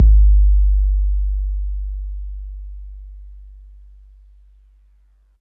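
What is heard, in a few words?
bass drum 808 MPC60 Nice G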